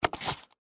Gun Holster Insert, Warfare, Gun, Holster, Insert, Handgun, Pistol; Pistols; Blastwave